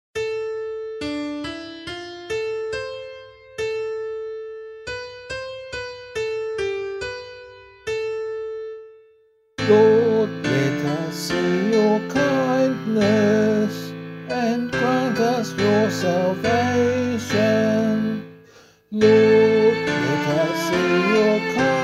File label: Psalm vocal